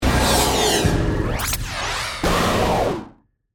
Stinger Wind, Production, Element, Stinger, Wind, Distortion; Stinger Sound Effects; BlastWave